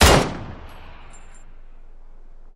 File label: Gun Sound; Gangstar; Gully Loops